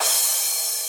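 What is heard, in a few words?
drum crash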